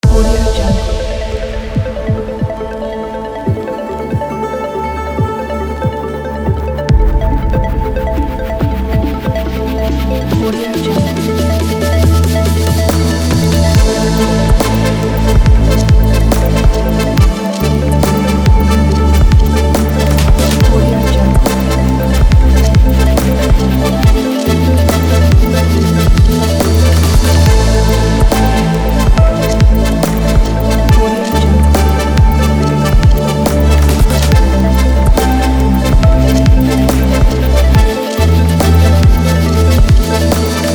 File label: the ambient electronic